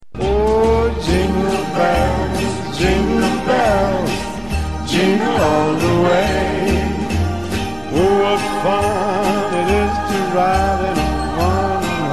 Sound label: Old Jingle Bells